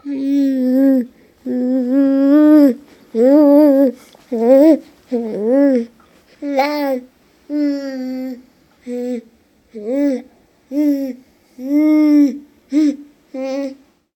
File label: Baby Says Mmm